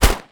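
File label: 9mm exterior shot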